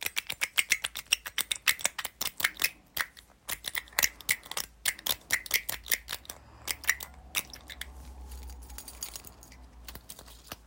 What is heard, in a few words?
fidget gear stick; Golden Crayon Sounds